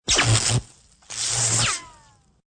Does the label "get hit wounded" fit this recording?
No